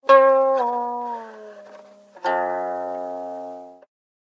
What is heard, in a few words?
Guqin, notifications; Lenovo A390